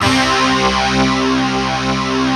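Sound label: distort, Fairlight; East West; Global Sounds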